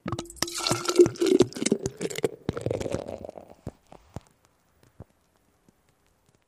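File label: Bottle Pour Beer Pitcher; eating & drinking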